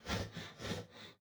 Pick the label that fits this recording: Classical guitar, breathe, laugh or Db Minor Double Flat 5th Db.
breathe